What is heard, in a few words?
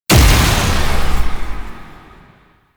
Wrist Gun Alt Charge Start; Weapons; Warframe; Tenno